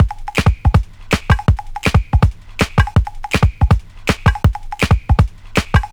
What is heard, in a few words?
Loops, breaks; M8